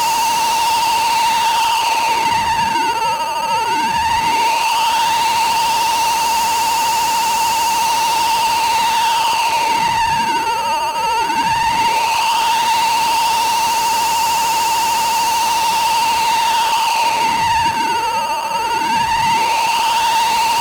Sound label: RealRingModem noise sweep